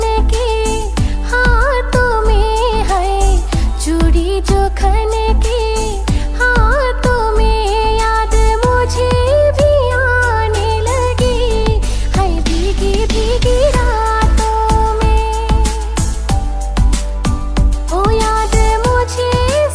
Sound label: beautiful love song ringtone